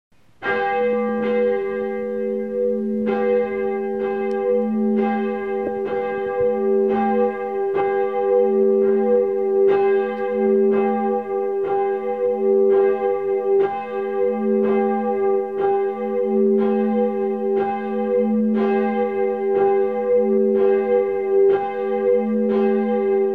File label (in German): glocken; spirituelles wissen; rituale; abendgebet